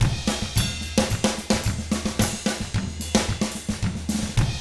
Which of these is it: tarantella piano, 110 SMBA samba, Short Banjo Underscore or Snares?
110 SMBA samba